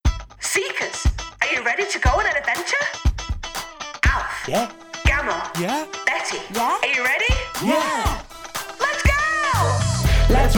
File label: Podcast jingle; HK The Seekers Podcast jingle